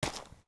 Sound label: FS Dirt, Footsteps